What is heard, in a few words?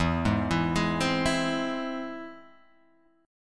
E Augmented, Guitar, Open G